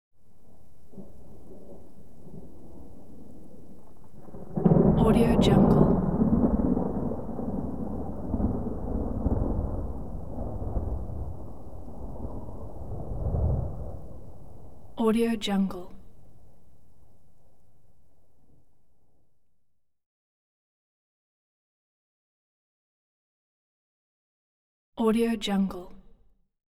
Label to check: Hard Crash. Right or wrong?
Wrong